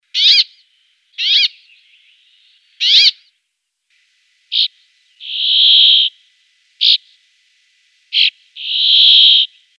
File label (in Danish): Kvaekerfinke; UgensFugleStemmer